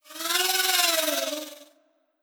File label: Drone Back